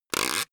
plastic pressured highly

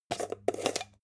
jar plastic midsize open short

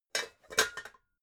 pots clank ring

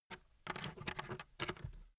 pencil pickup

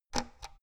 oven knob turn hard squeaky

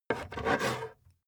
metal scrape on wood

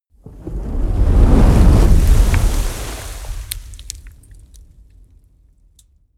whoosh fire ball crackle large

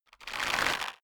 bow load

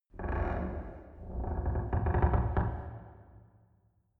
church creaks

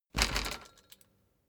bicycle street bump

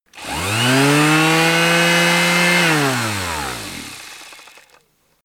power tool long

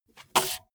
bmx bike tire foot scuff long

